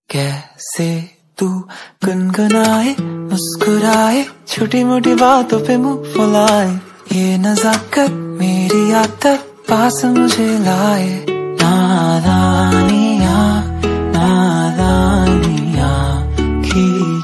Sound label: ringtone love ringtone